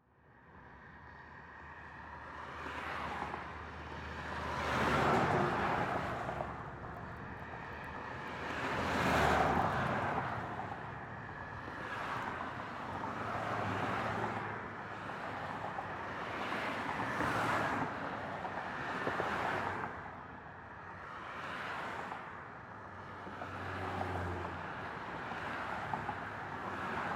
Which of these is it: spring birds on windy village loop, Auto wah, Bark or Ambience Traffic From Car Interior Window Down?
Ambience Traffic From Car Interior Window Down